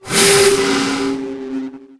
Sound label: hammerhead hit; DAoC